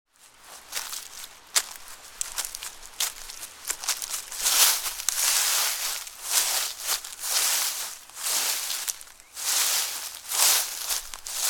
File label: Walking Through Leaves, Footsteps walking through Autumn leaves; Human